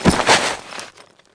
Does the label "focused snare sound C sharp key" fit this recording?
No